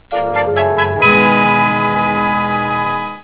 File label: drama sting; bradybunch